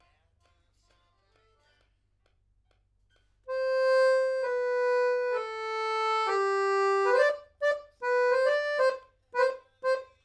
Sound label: Accordeon